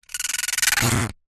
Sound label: vibrations cello bow multi timbre cardboard tag short; sound spark